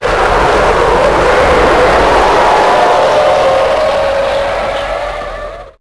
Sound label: truck braking skidding; Max Payne; tenements